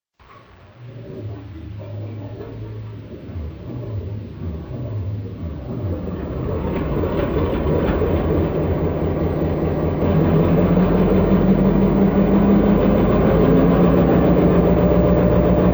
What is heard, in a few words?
Motor Diesel